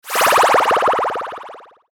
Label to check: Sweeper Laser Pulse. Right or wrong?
Right